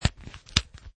Unbutton Metal; Buttoning up metal buttons; Snaps; Snap Sound Effects; Objects

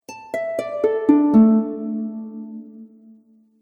Harp Slow Arpeggio; Musical

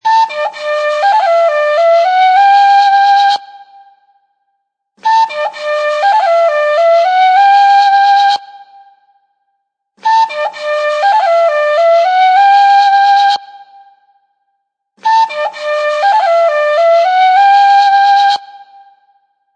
Tin Flute; Ringtone

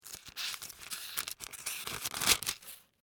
Bible Turn Page, Book; Foley; Books; Offices; BlastWave

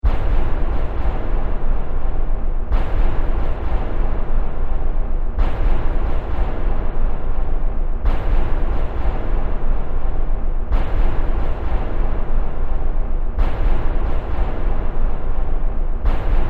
Rhythmically haunting sound of a distant train; Trains; Vehicles

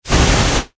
Flame Thrower Burst Short; Warfare; Miscellaneous Combat

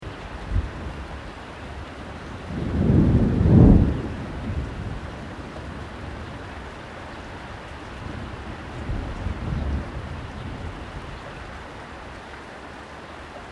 THUNDER DISTANT LOW RAIN; Elemental weather effects

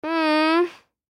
Human Groan Short; Blastwave

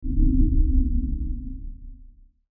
Swell Low Bass Short; Blastwave

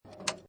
Pinball Sound Ball Roll And Passing Object; Video Game Sound Effects

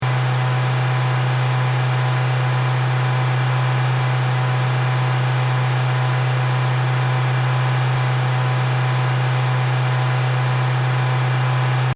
Car Mazda 3 High Rpm Loop, Engine Loop; Power Car sounds

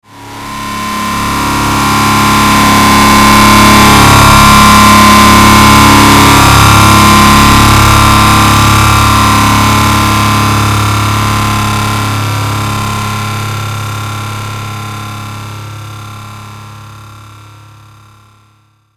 Swell Harsh Distortion Buzz

